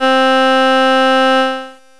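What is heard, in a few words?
violin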